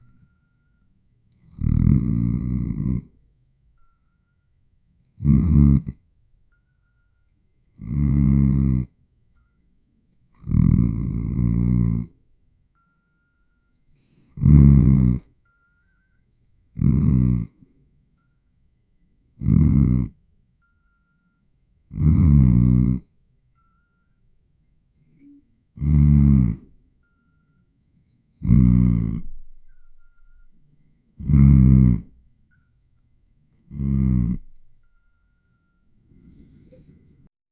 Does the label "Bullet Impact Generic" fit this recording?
No